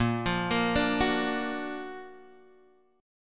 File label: F sharp Augmented Major 7th A sharp, chord